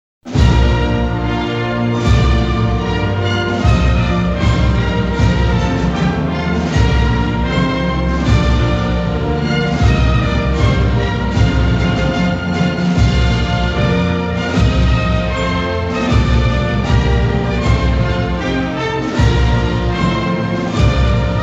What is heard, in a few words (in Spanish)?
himno nacional version breve; historia